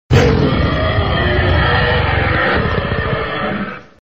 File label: Tyrannosaurus